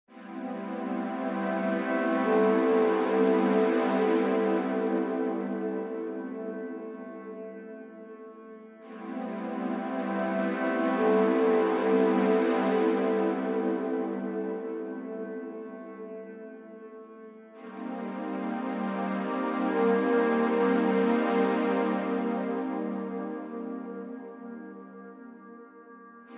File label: Ambient